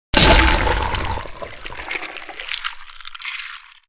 Skull crush

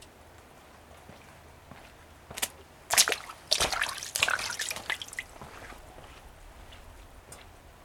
Puddle

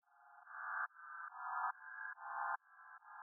Growl spectrum

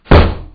Blam

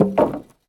Wooden Log Falling

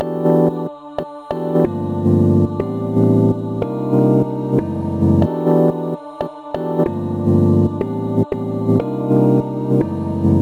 Couch Cushions 92 bpm Melody No Horns

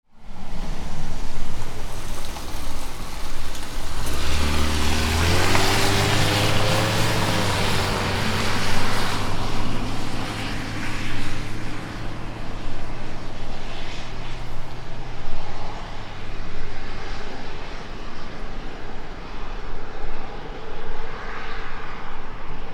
Car Come And Go

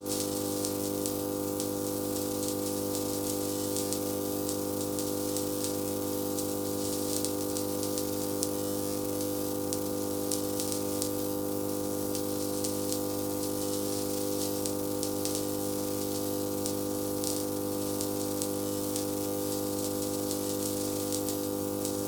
Electric Hum And Buzz With Sparks Designed

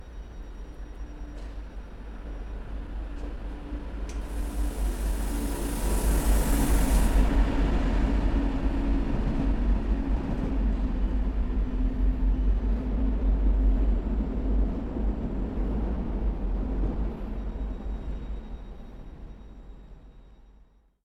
Bremen Train

